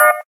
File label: gayageum, keytone